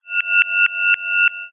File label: science fiction radio receiver transmission interference burst pulsating high pit; high pitched beeps; zapsplat